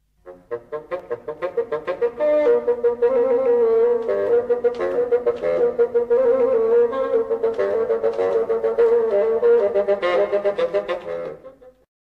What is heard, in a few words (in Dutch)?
Houtblazers Fagot alleen